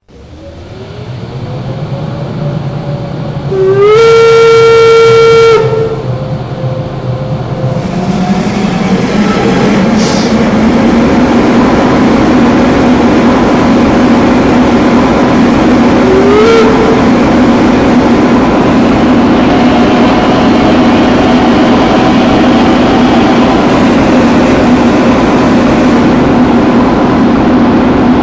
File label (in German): Dampf BR52 Kondenstender; H0; LSV40 Retail